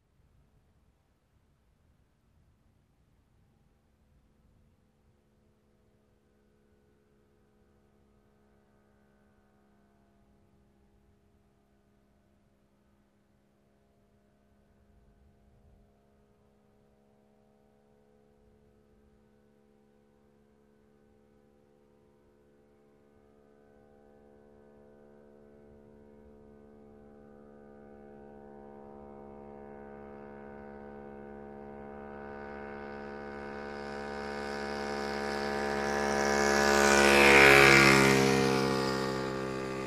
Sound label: Motorcycle passes by